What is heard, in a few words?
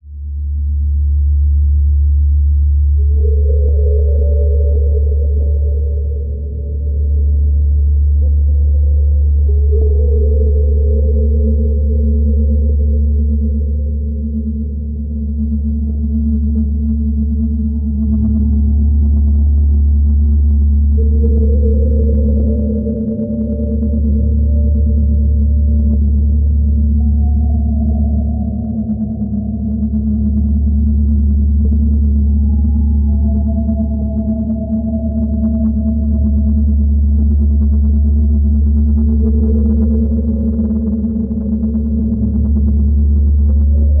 Engine Room Whales; Barotrauma